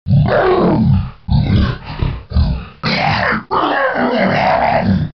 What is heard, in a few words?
Troll Monster; هیولا ترول